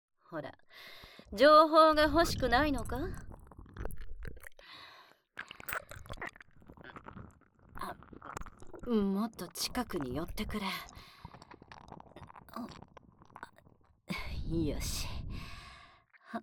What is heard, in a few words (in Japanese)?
耳舐め キス 手コキ フェラ パイずり 騎乗位 される音声; 捕まえたクノイチに